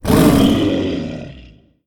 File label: rage attack; sfgame